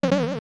Zora Fireball; LTTP; Zora shooting a fireball (also Beamos seeing Link and Wizzrobe shooting magic)

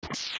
Steps Lava; zelda; OOT

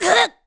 Knock Down; Link getting knocked down; Zelda

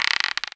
Rope Swing; Link swinging on a rope; Zelda

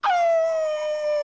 Wolfos Howl; OOT

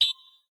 Cheer; Cheering emote: 1 pitch for each Link (Recordings)

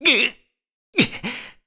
Link Carry Stamina Very Low; zelda sounds; Skyward Sword

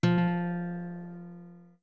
Notes Guitar F long; Zora guitar (long)

